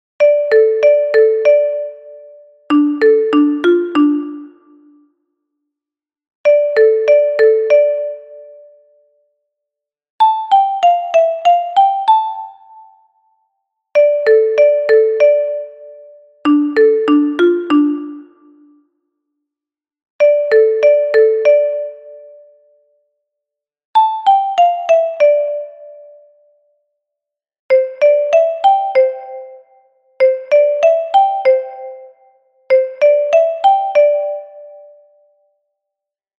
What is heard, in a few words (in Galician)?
Xilofono Contralto; Samain